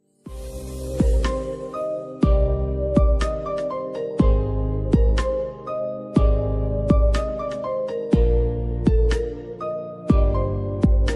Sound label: gudok qisqa muzika ringtone